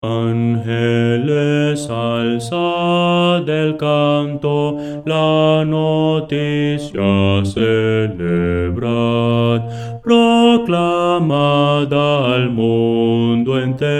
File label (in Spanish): bajo, himno; angeles alzad el canto